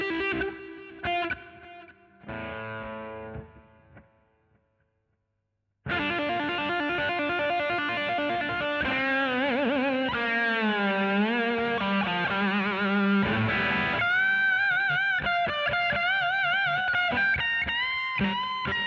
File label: GTR B Solo